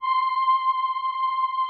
VP-330, String Machines

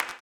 CLAP, blues & soul; ILIO; Vocal Planet

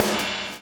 DOWN, DOWN KIT 78

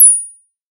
Borg Hat Open, drum kit; Polyend; Stazma & Concrete Collage; Concrete-Dual Borg

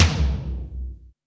MID TOM; Back In Time Records; Big Bang

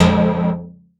stab error F, Noise; stabs

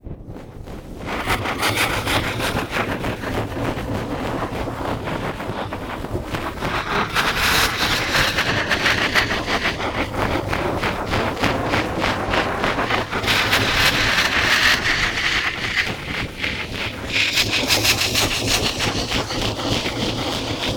Spooky Shaft, Ambient; Zero-G Seismic Frequencies; 90 s Sample CDs

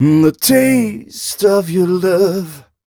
m 128 mel e-flat, Vocals

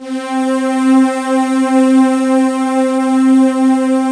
PAD Pad Pad; Best Service Gigapack 1

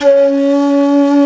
shakuhachi C#2; InVision Interactive; Lightware VOL-1; Instruments & Percussions

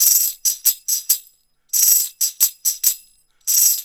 Roots Reggae; Sounds Good - Roots Reggae

